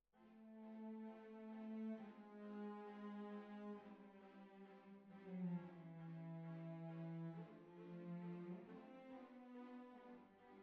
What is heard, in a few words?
Cbasses